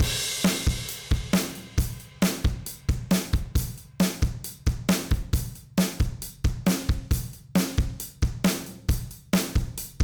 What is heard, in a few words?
SATURATION PLUGIN AFTER